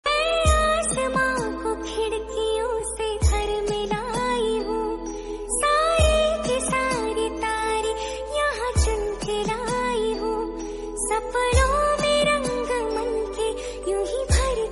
love hindi song tone ringtone

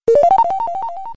triiiiing coin louder music tone ringtone

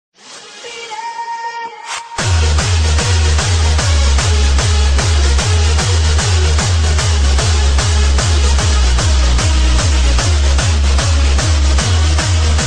hardstyle beat ringtone

